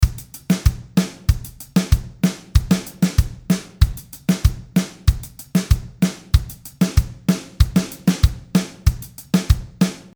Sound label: Simple Reggaeton Drum Beat